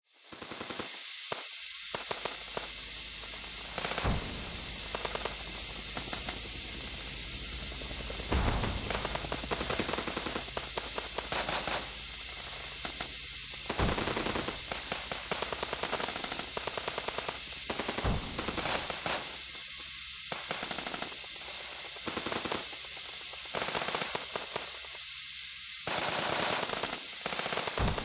Warfare Modern War Battlefield Jungle Night Distant Fighting; Vietnam; Battlefield Sound Effects